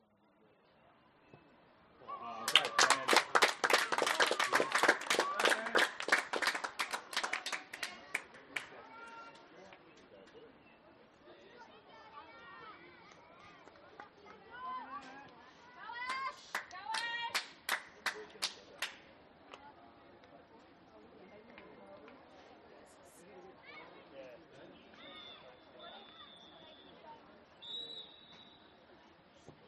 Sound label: sport soccer game school competition stand small amb parents children clap cheer